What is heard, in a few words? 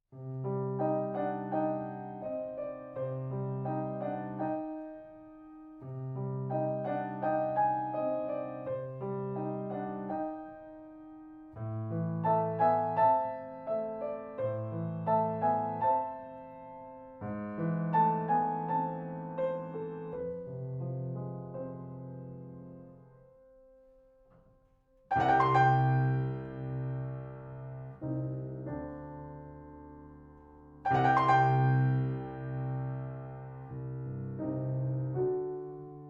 Piano Horror Vignette